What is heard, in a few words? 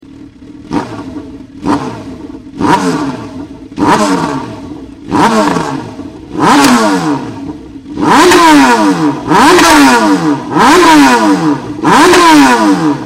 CB1000R open